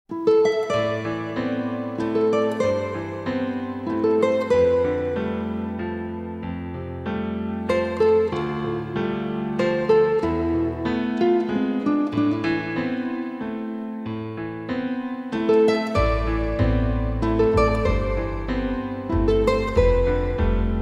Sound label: Ocean Waltz, Instrumental